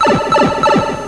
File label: pipe; GAMECUBE; SUPERMARIOSUNSHINE